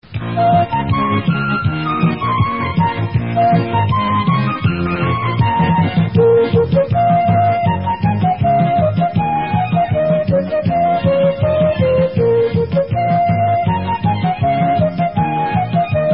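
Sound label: Instrumental, Israeli Dances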